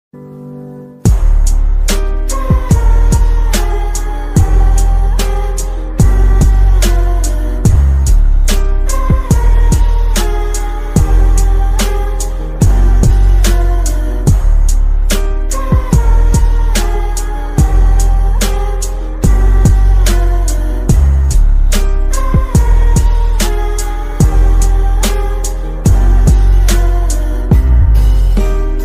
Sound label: bgm beat and bass